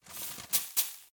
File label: fertilizer; Plants vs. Zombies